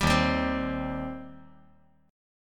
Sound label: Eb 6th Eb down